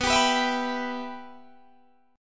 B Suspended 4th Sharp 5th B Guitar DADGAD

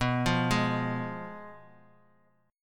F Diminished B

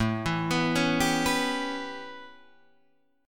A 9th A, Guitar Open D; 7,7,9,7,10,9

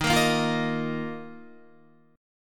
D sharp Suspended 2nd, Guitar; D#sus2; strummed; 10,13,10,10,10,11

